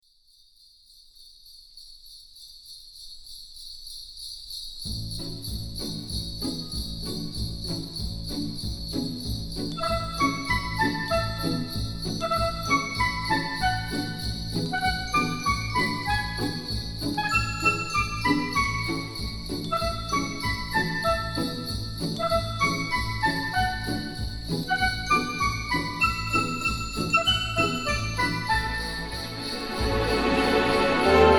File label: Jingle Bells, Orchestra